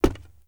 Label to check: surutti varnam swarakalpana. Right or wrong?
Wrong